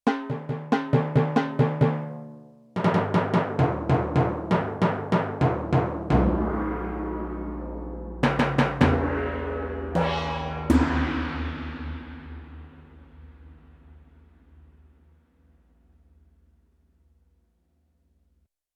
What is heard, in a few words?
High Cut, Timpani & Gong